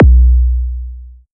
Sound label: Kick